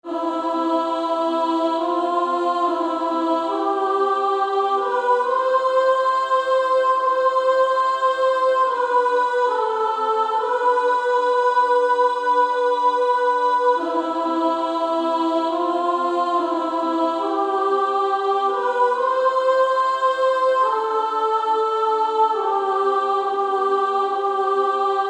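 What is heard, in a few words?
Female Choir, soprano 1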